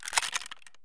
saiga slider jam; Escape from Tarkov

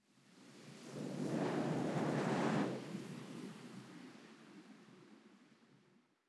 ambient; World of Tanks

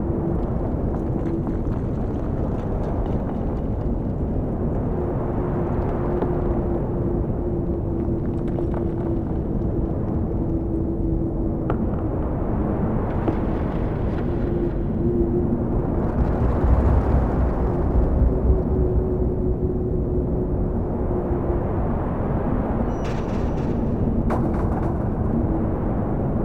ambience city

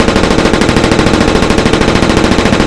Inf Weapons; Red Orchestra 41-45

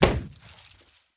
cannon; hangar ny 2018

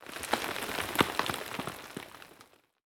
impacts; World of Tanks

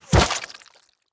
flesh bullet impact; Rust

